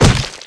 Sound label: broken